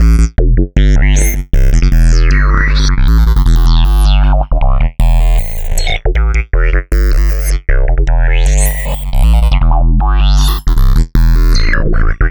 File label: Synth Bass